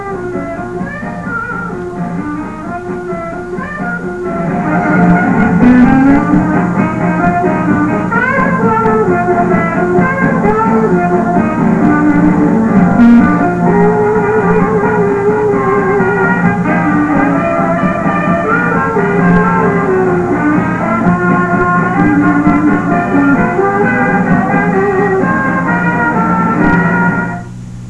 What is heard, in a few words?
Brit Music; After the German invasion of Poland on 9/1/1939 until the invasion of France on 5/10/1940